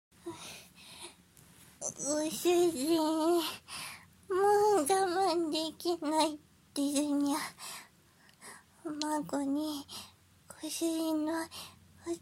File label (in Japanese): 発情四つん這いディルドピストン